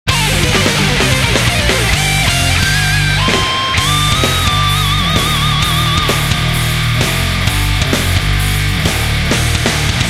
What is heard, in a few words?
Boss Win